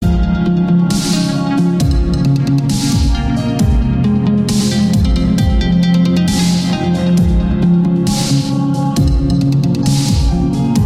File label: Toxic Dubstep